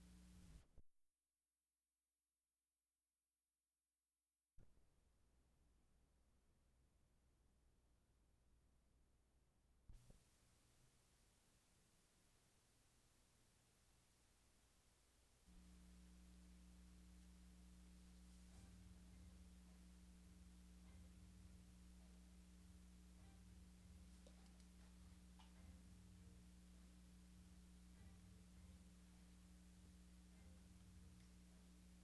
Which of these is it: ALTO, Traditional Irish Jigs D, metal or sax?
sax